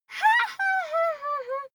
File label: child laugh fake haha